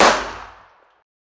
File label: fire dist int pistol light